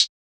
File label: Hihat